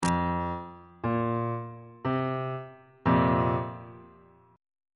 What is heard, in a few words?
f suspended 4th chord